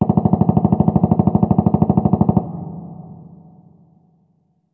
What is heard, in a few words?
dist machinegun